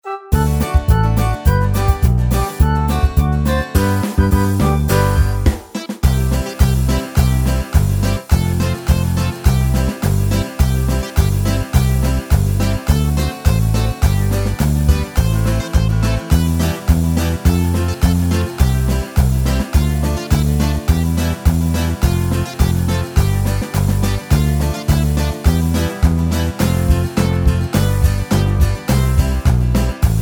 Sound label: Karaoke